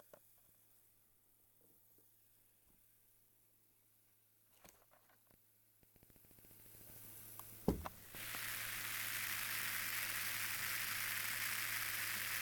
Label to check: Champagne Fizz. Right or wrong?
Right